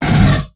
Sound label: M41A double shoot